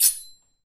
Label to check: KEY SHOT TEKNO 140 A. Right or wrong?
Wrong